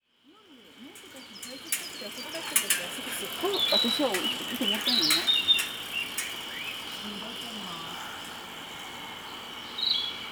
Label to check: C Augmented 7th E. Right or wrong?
Wrong